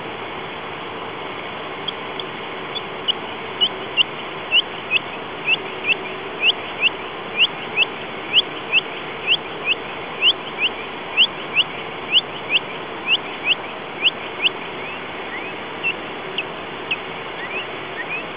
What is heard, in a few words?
whales humpback chirps whistles; cornell